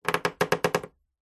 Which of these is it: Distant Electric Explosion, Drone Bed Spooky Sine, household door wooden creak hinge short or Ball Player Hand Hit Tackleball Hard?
household door wooden creak hinge short